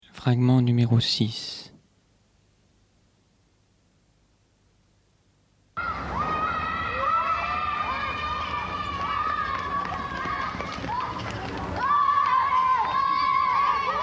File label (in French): scene marchand glaces; proxemie acoustique habitat